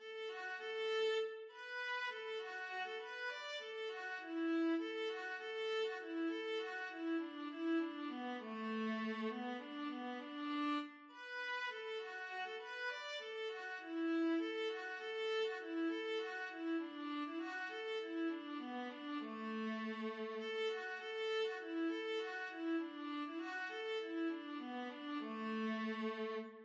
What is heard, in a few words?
Cowboy, viola